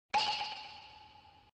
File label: comical pop